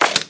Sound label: card remove; MuRummy; MUDevs S20.1-3